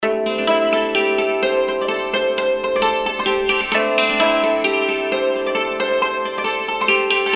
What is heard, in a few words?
Yangzin Loop